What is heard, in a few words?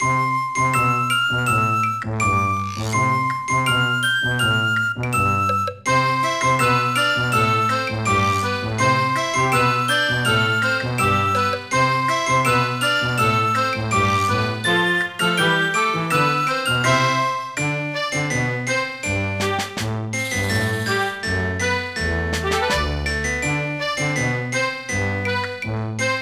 Music Theme; Tales to Enjoy Little Red Riding Hood